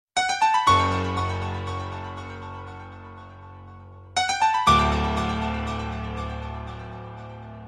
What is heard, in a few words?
Sms Tone